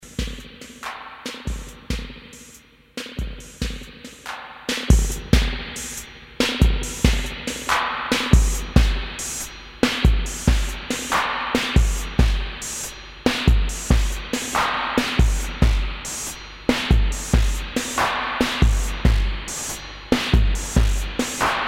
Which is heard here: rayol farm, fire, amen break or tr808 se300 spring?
tr808 se300 spring